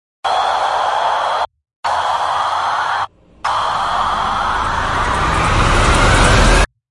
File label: Rise Only; Ninja Tracks